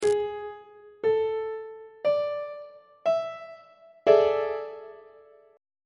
g double sharp major 7th suspended 4th 3rd inversion on treble clef